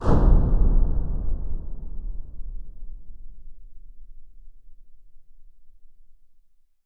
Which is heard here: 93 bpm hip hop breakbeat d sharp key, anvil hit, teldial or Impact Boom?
Impact Boom